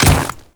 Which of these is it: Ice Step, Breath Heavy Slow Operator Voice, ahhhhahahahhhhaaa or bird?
Ice Step